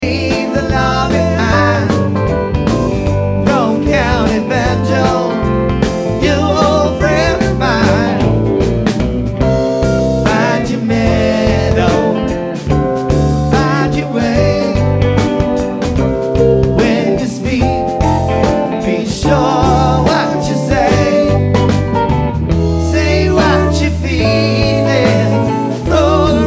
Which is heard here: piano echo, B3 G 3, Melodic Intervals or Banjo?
Banjo